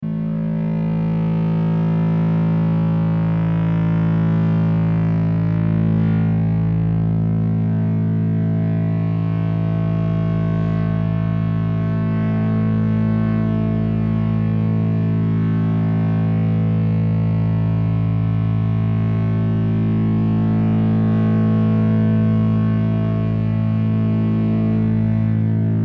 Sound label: A Drone on Ebow